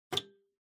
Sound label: PC Hardware LAN Unplug